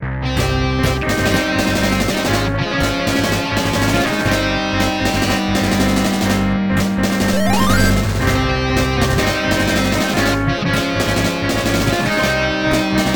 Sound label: Battle, guitar; shining guitar